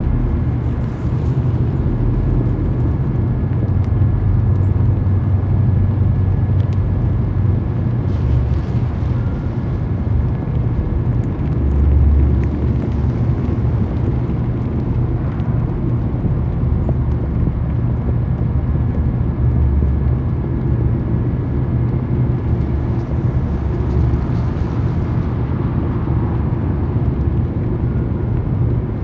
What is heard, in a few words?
Dojo Earth, ambience; Ninjago 2D